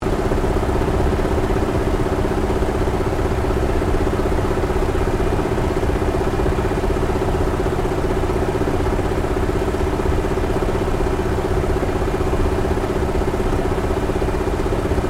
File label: AIRCRAFT CAC WIRRAWAY APPROACH INTERIOR; CA-16 Wirraway; Airplanes; Vehicles